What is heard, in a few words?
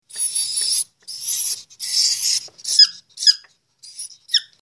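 mink squeak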